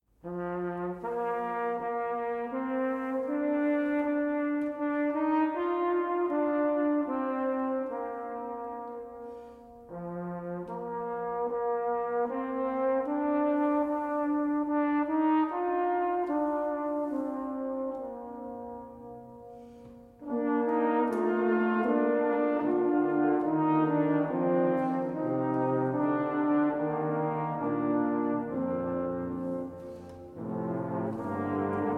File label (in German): klangerlebnis orgel